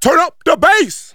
Choeurs & effets; L'Effet Pommier - Volume 04

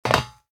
saut

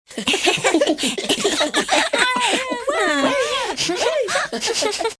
rire enfants; MOBICLIC 65

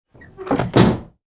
porte

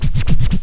chenill

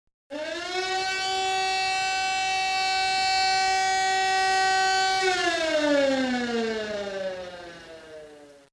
ALARME

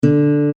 GUITARE 2 RE; MOBICLIC 77; RATOCLIC

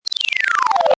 dezoom; MOBICLIC 72; CPLUSCLAIR

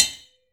BRIQUE; Ultranium 3D

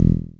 basse; CLARITY

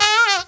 Clic nez

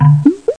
etouffoir

